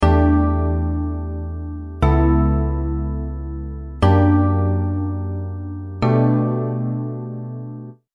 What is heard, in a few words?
Plucked Rhodes